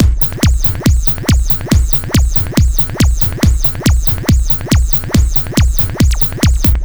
Dance Drumloop; Drums; Dance Beats

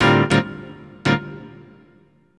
Electric Piano, Loops, Keys

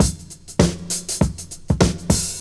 Old School Break, Drums

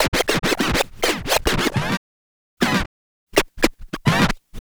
Turntables

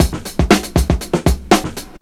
LOOP; SoundFactory; DRUMLOOP

